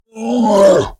Screams Shouts monster processed; soundbits

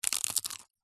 human eat bite crunch papadum

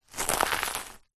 food potato chip crisp crush crunch under foot; zapsplat

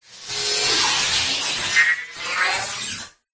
science fiction robot recharge power up fast

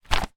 directory telephone paper page turn; foley

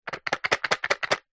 leisure game travel plastic box shake; cluedo; zapsplat

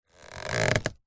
household basket picnic hamper lid open creak

